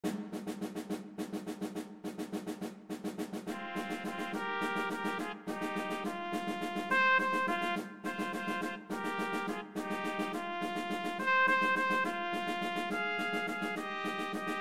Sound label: Lhomme arme, brass ensemble